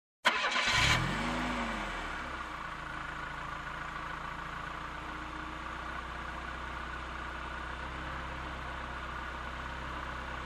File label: Cars